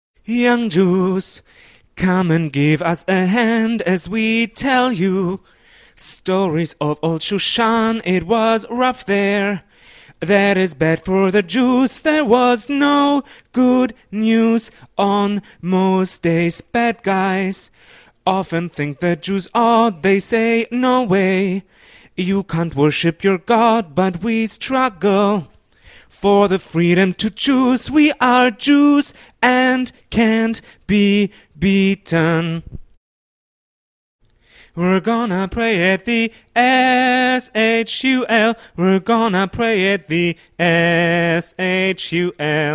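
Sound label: bass, choir music; BCC choir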